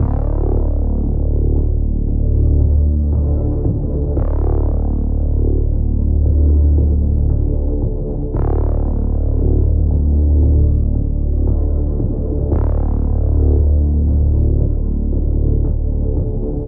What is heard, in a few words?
Bass SLICE LOOP; CS OST PurpleSkullsTheme; crossing souls; ps4